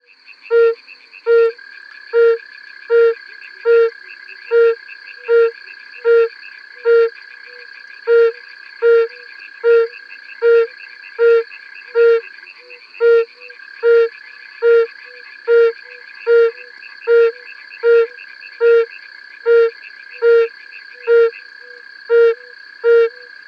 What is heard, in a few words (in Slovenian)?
hribski urh bombina variegata solo; zvoki zivali; pohorka